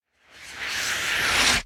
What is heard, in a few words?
Missile Travel; lancer weapon fx